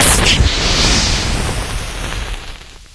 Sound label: ignite, fire trap; hl2